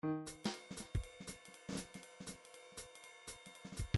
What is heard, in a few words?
midi grooves bebop